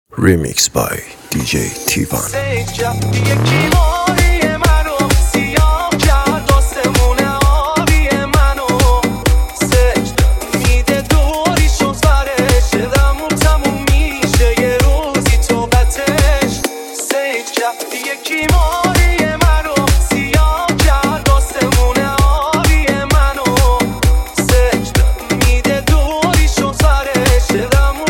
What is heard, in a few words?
music sad; آهنگ غمگین گریه آور مخصوص اینستا